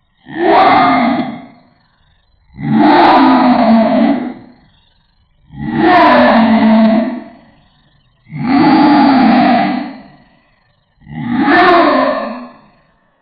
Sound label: roar, aggressive